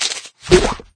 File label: Evolution Ready